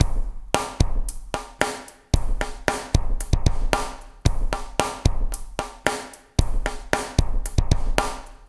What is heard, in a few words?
Liquid Grooves; Spectrasonics; 113 LIQUITEK